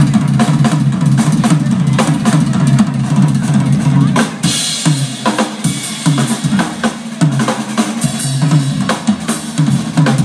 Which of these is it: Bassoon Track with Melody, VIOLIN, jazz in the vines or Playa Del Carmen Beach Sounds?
jazz in the vines